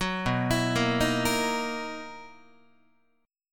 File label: B Major 11th B down Guitar; arpeggiated